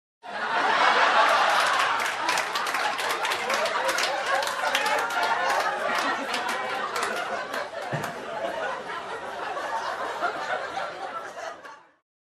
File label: Crowd Laughs with minimal clapping